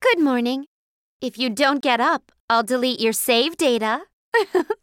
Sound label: Alarm; Neptunia Vert